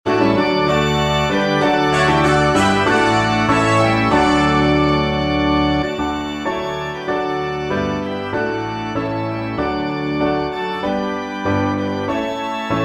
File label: Joy to the World with trumpets